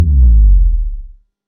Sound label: logo reveal, impact; huashu design; sfx